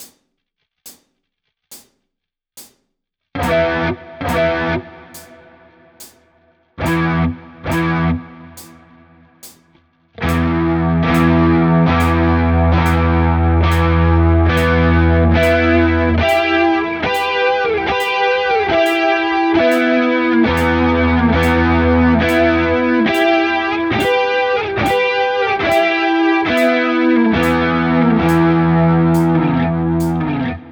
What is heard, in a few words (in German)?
Coole Rhythmen mit Delay 70 BPM ¼ 1 Wiederholung Straight